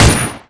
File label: fire; vendetta; weapons